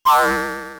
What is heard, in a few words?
enemy fast movement; SNES; SMRPG